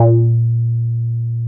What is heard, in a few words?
A#2, Bass; E-MU Producer Series Vol. 6 – Analogia Project (CD 1); Boom da Bass 2